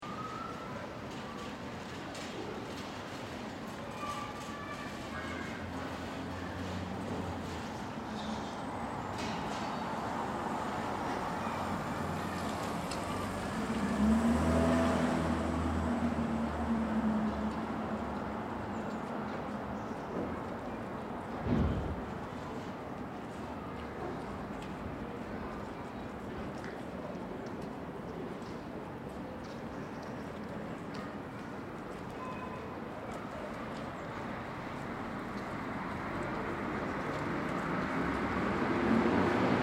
Street Calm Kids, Street environment with distant childrens voices. Cars and trucks pass by.; Calm and airy. Travel bag on wheels passing by.; School Sound Effects; LynneMusic